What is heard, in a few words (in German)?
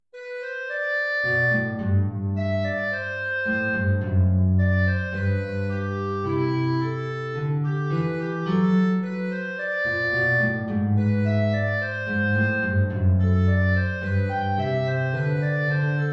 Andantino, Klarinette in Bb & Gitarre, hoch